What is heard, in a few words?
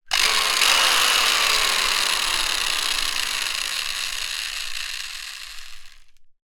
industrial tools drill electric 2 short power test bursts; sound effects one